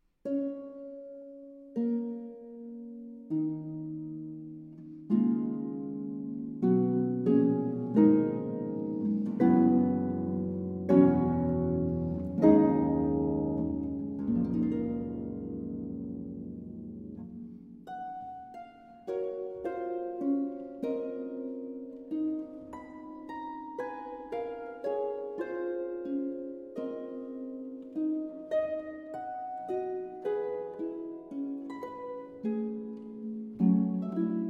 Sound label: Sonata For Harp, Lied. Sehr Langsam